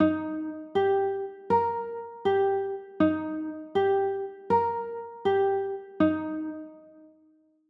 e flat maj, chords

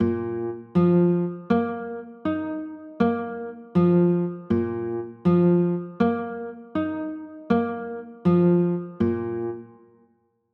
b flat sus4, chords; pos 4

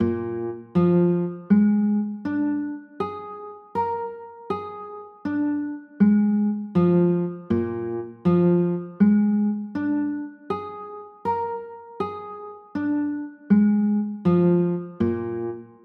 b flat 7, chords

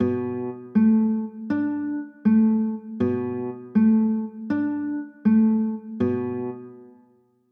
b m7, chords; pos 7

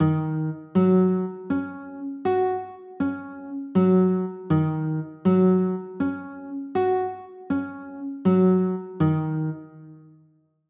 e flat m7, chords

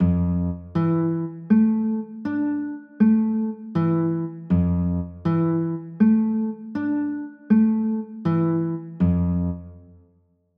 f maj13, chords

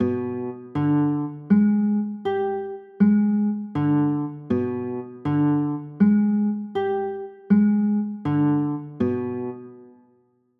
g 7 b9, chords

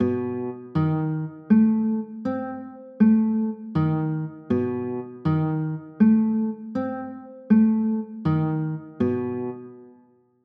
b 7 b9, chords

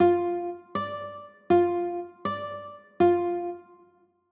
d m, chords

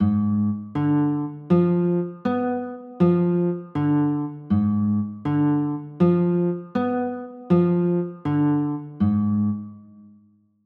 a flat dim7

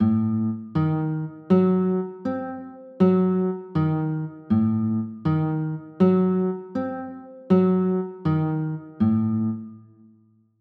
e flat dim7; chords

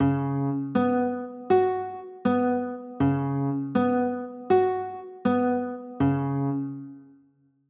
c sharp 7sus4, chords

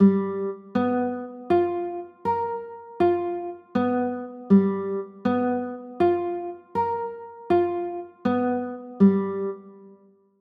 g 7 sharp 9; chords

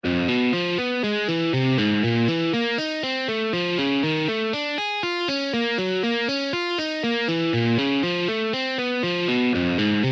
alternate picking exercises; deadly spider

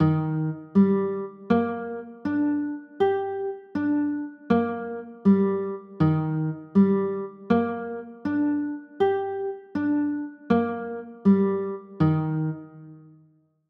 d sharp maj7, chords

